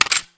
dual de r clip in, weapons

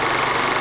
projector; ambience

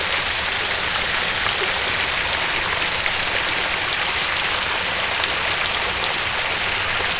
waterfall

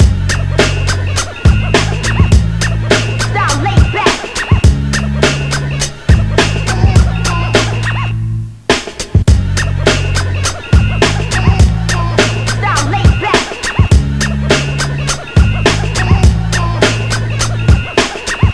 funk ride

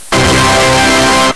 tada

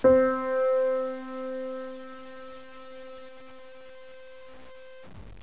piano c3

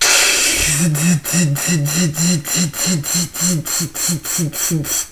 alarm

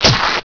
tube critter hit

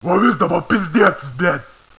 zombie alert; zombie soldier

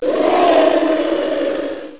fr pain, friendly

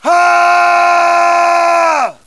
haaaaa

